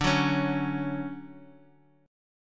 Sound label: D-Major 7th, D, 4G, 5C, 5E, 5A